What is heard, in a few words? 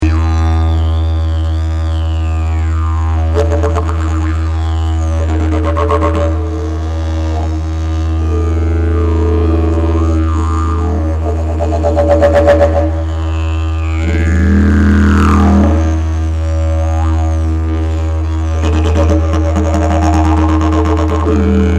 didge